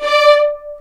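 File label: D5 marcato double bass, Arco; East West; The Ultimate String Collection; VLNS ARCO DB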